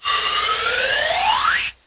Minigame Injection

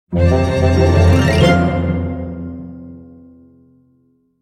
Jingle Surprise; mata hari windows gamerip 2008

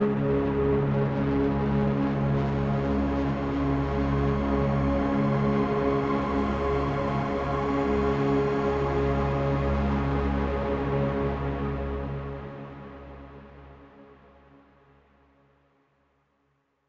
Overlay Drone; The Shard; Mirror's Edge Catalyst

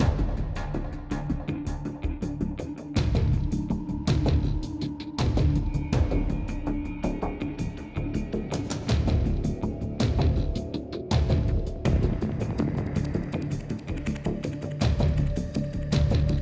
Combat Mixed

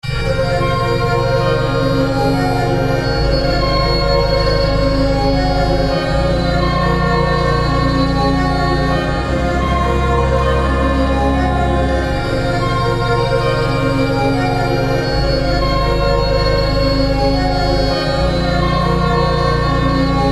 Pipe Organ Ambience; fatal frame iv

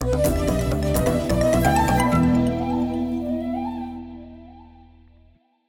Music Jingle Game Over

